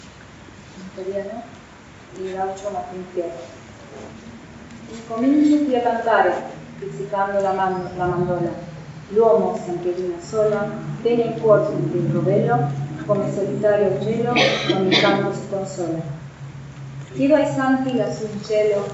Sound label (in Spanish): Primer canto en italiano, Italiano